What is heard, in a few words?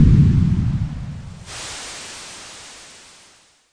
spray; VIDEO GAMES